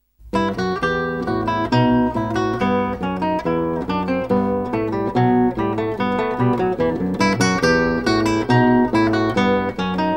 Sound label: bourree